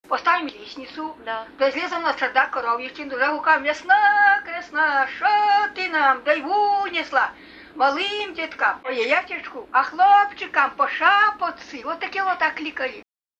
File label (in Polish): hukanie